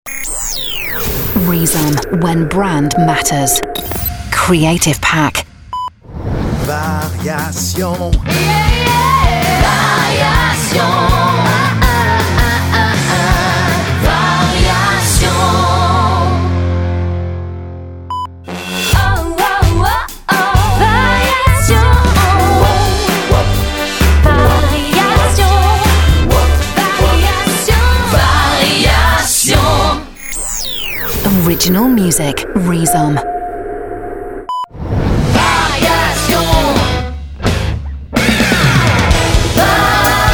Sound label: Full Sung Jingles; Variation Été 2k11